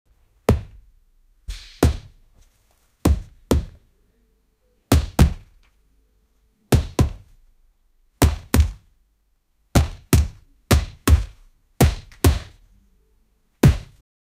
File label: sound effect of punching, fight